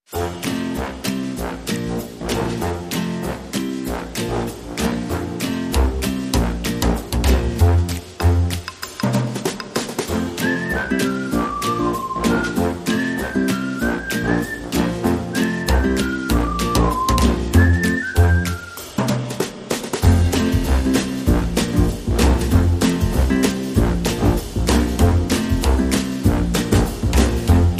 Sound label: Whistles And Fun